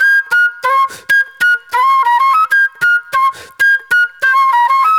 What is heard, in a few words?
NY 70's Jazz Flute